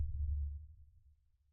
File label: sonar Tail Air Medium Beacon Directional; Barotrauma; Real Sonar